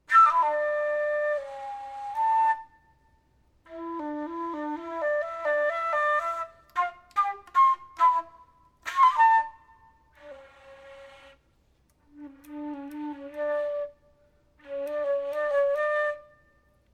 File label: flute prelude